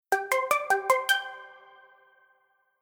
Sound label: Reverb Delay Pluck; Mix Insert